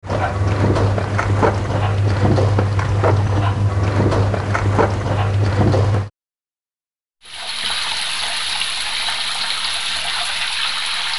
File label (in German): waschmaschine dusche foen